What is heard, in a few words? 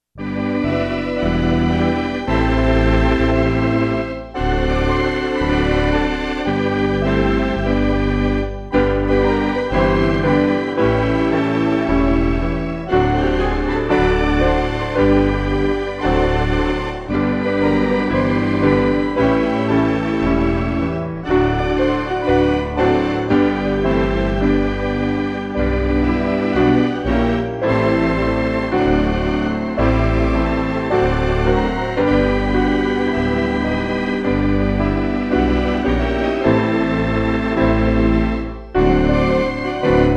instrumental reference mix; He Keeps Me Singing